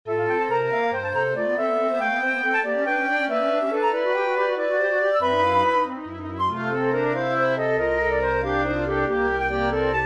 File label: a flob of flutes